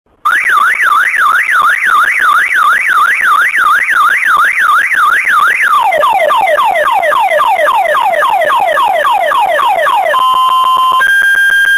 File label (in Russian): zvuk avtosignalizazii